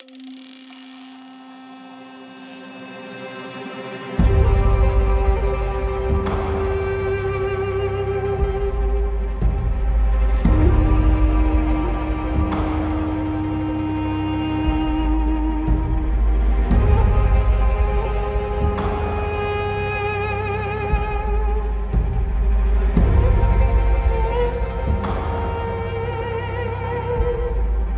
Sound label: Tension 57.5bpm; project zomboid; The Plan Start 6-4